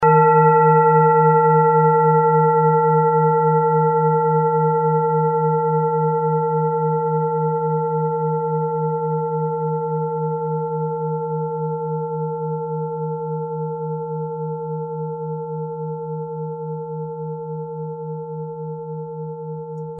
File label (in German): klangschale set; Klangschalen-Chakraset N°12 für alle Chakras